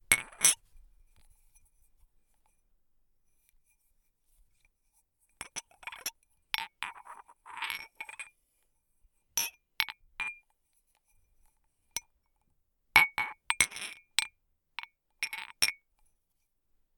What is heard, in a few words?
Handling A Glass Manipuler Un Verre